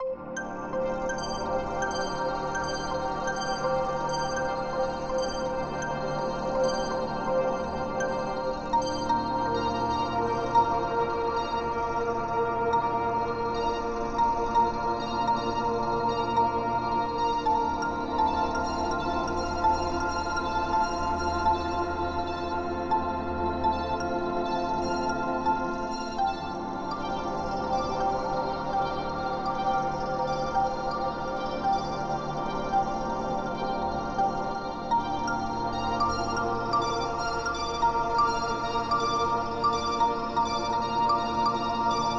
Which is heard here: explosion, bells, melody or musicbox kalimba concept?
melody